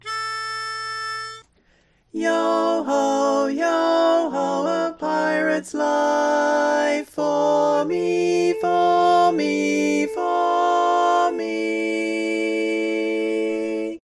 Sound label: Female Bari, barbershop